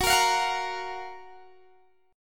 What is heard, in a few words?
F sharp Major 7th, Guitar, DADGAD